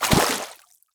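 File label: water jump up, footstep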